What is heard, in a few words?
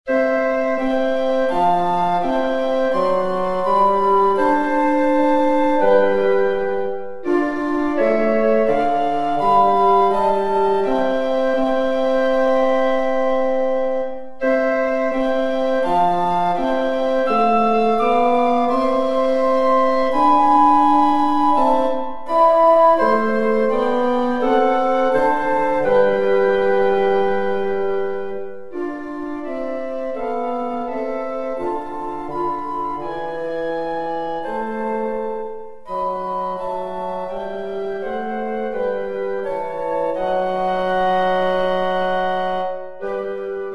woodwind trio